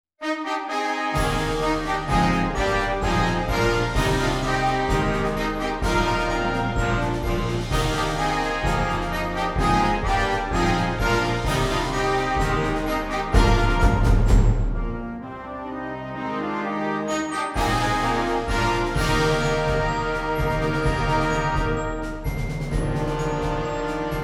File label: Fanfare